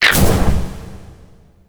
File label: Toggle Off; Kahl Order Mode; Warframe; Veilbreaker; Devourer Kahl